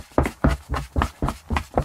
climb ladder; Movement

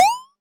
ready up; Multiplayer

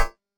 throwing knife; Weapons; freepixel

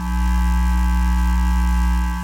moving platform; Platformer

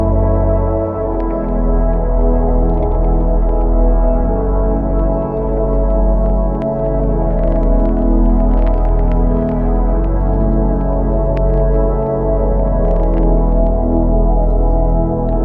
bg cyber chill, music; freepixel; Tilter

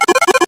draw pile; Cards & Board

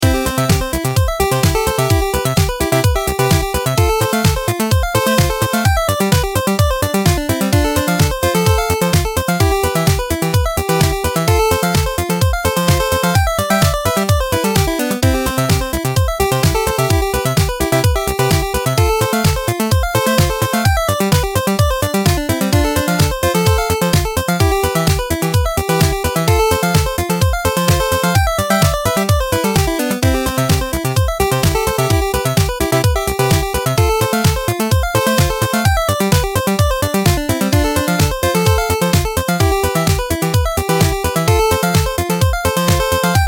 minigame fun, music; freepixel; Casual

